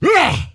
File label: Attack; Ley Guardian Eregos